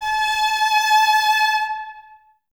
String Ensembles, Violas; Miroslav Vitous; LINKS ORCH

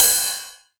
909 Ride, Drum Machines; DrumstationV1

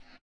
slip slap; Spectrasonics; Metamorphosis

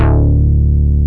FUNK G2, BASS; East West; Global Sounds

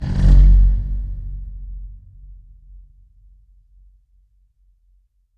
Pipe Rub; Effects

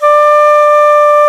VENU D4, Winds; E-MU Producer Series Vol. 7 – Old World Instruments

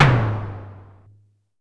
Drum X Tom Misc; Pearl Drum-X

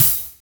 HAT; East West

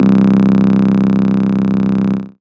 bass C, Acid Bass, Dance Synths

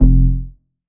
Bass F, Bass Synth; Instrument Oneshots; 99Sounds; Monosounds

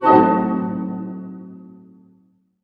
HHIT A DIM, HARP HITS; Sound On Sound - Peter Siedleczek's Orchestra

